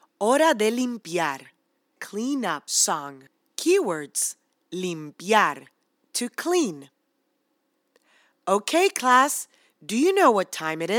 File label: Clean-up Song Spanish; Rituals